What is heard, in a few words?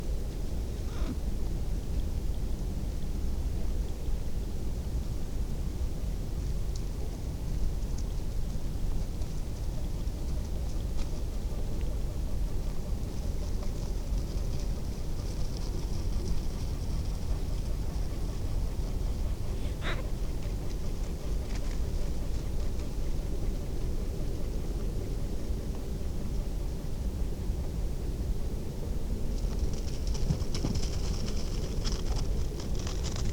european hare lepus europaeus